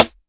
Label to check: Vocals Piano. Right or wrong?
Wrong